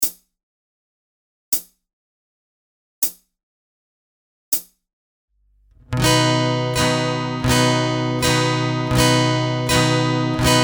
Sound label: The Pendulum capo 4 SLOW, strumming; Ultimate guide to strumming book